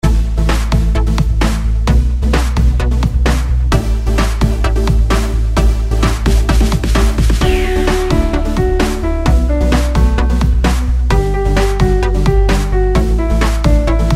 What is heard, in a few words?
instrumental